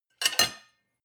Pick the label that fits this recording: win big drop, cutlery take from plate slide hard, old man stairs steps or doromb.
cutlery take from plate slide hard